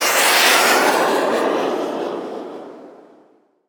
Missile; 1001 Sound Effects

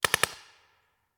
Paintball Gun; Sound Ideas; Guns

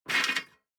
DICE on Metal, Grab, Standard, Six Dice; Samples; ArticulatedSounds; DICE Sfx Party (Extended)

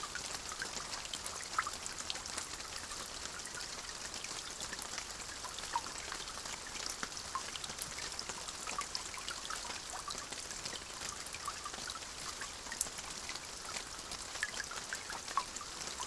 Rain light drippy aftermath; boom library; Thunder And Rain

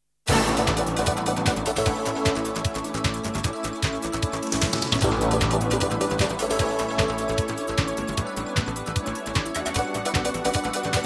jingles; 90 DDD Jingles

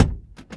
car rear open, street traffic; best service Blue Box

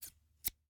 Scissors, snap, snip, shear, med large, single; Eiravaein Works; Vaeyan IV

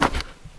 sand

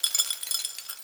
Chandelier Crystal Rattle; Foley - Rattles

